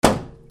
CARS

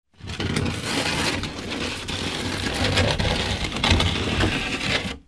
FRICTION

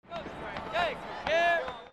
CROWDS

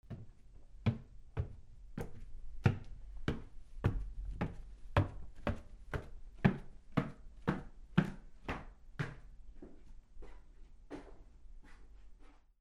FOOTFALL

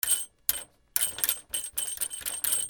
BATHROOM

